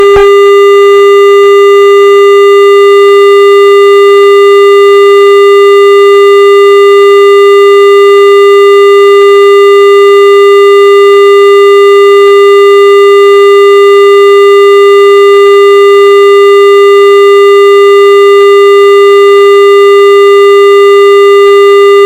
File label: recording hello modulated tone